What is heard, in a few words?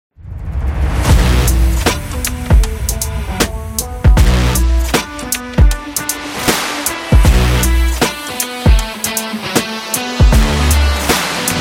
Hip Hop Trailer Rock